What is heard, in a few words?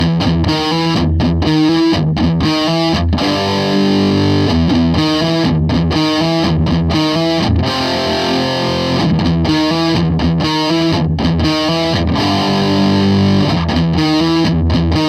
high gain; Gitarre